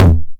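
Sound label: 909 mid tom tape saturation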